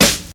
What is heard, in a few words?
00s natural steel snare drum sample g sharp key; Duration 0:01